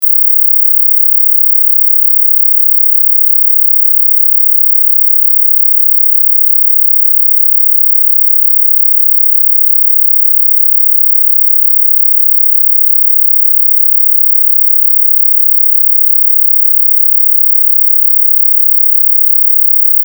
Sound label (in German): 20khz, Testfrequenzen